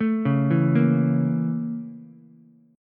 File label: Bb Minor Major 7th Flat 5th Bb, Ukulele; BbmM7b5; arpeggiated; JGuitar